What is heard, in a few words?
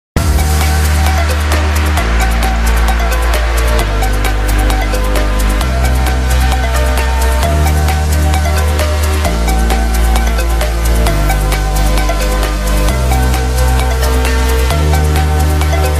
Dance Trance